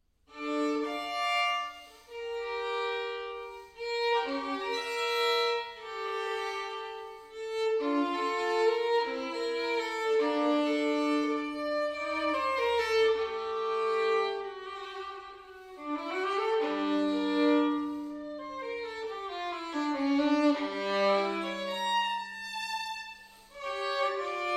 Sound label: Violin; Bach Sarabande